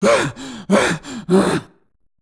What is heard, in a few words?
pain; uub